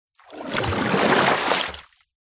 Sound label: nature water deep movement surface